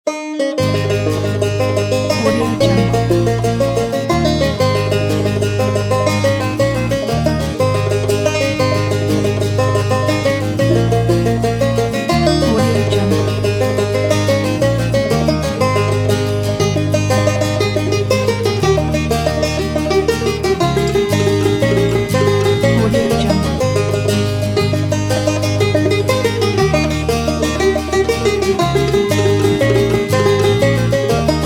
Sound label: irish banjo and guitar